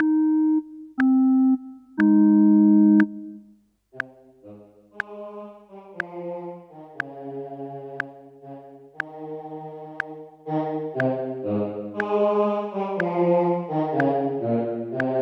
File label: O clap your hands; Gibbons